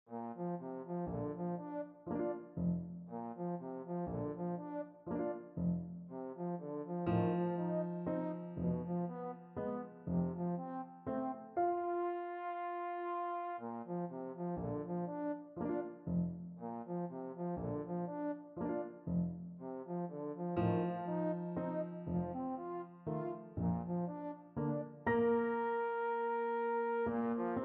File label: valse lente, trombone